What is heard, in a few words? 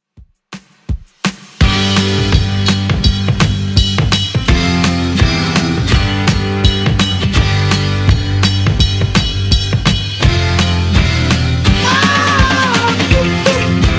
70s Redrum 167 bpm